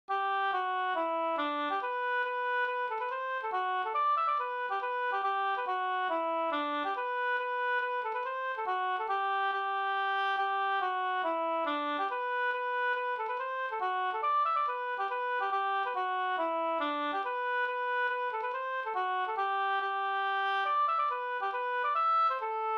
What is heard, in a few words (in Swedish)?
hambopolska